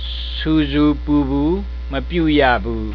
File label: nonoise; Burmese; ဆူဆူပူပူ မပြုရဘူး။; Do not make noises.